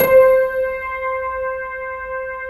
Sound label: PNO HEAVN; EDENPNO